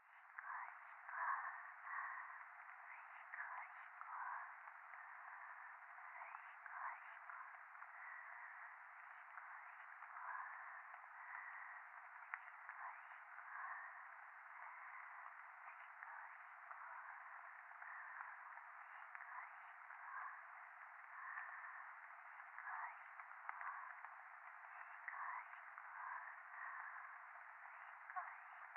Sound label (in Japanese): しこしこ 雨